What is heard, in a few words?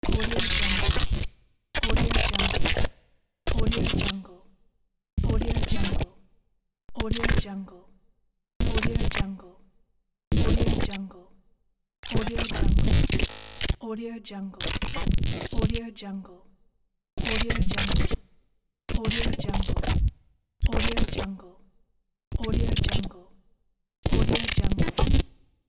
glitch pack